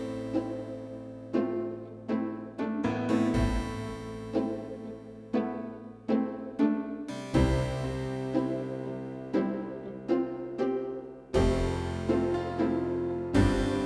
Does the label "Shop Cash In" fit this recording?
No